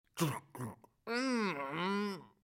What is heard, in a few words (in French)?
porte qui grince ouverture